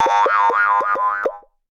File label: Stars Sound; Jolly Battle